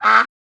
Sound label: goose honk